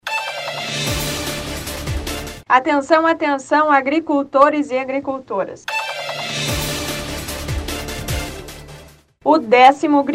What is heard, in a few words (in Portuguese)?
Grito de Alerta